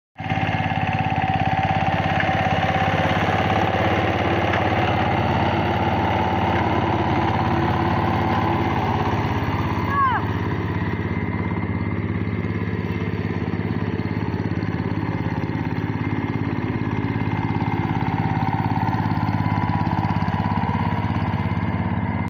Agricultural tiller

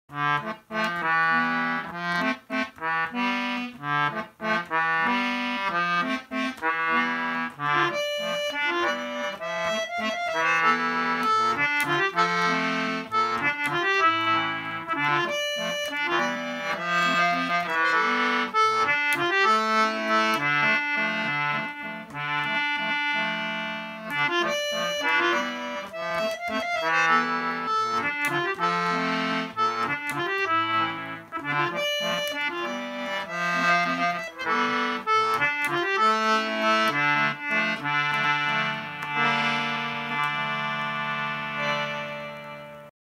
Concertina; کنسرتینا

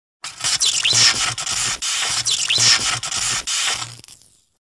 electrical connection, اتصالی برق

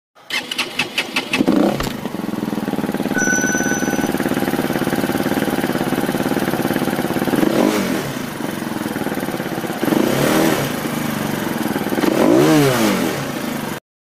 motorcycle; موتور مگلی

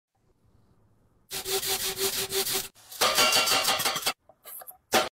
scraping iron

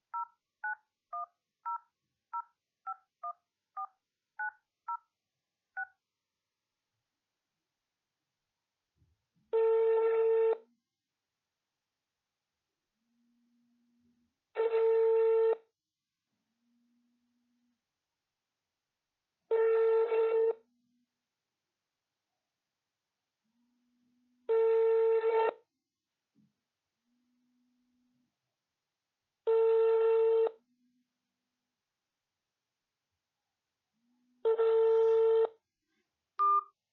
push button telephone; شماره گرفتن تلفن دکمه

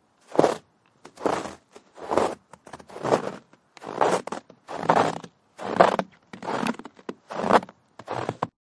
walking in snow; راه رفتن در برف

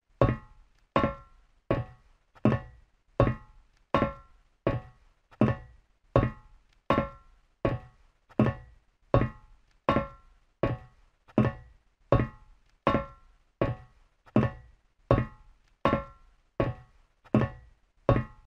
Walking on metal

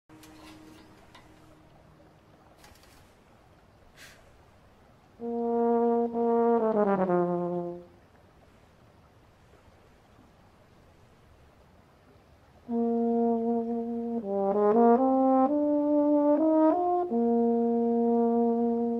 Euphonium; یوفونیوم

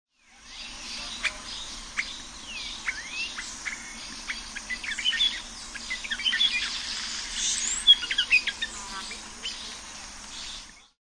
Red headed malimbe

